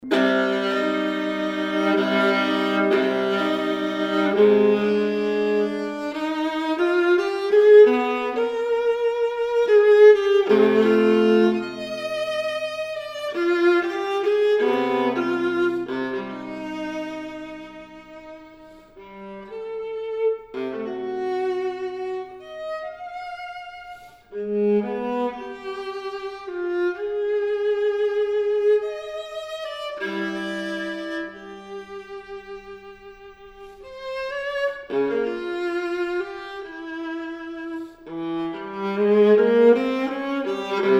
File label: Sonata for Viola and Piano; 2 Mvmt